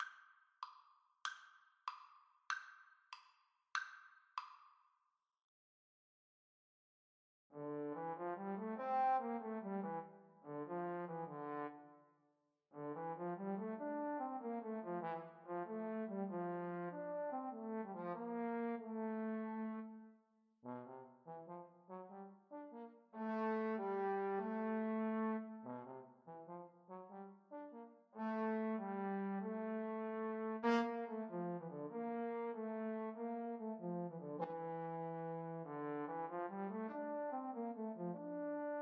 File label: Trombone Duet